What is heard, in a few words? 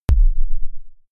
sub kick noise soft